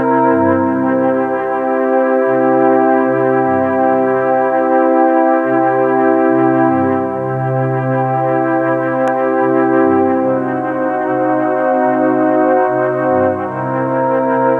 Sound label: Stable; Discworld II Missing Presumed; MS-DOS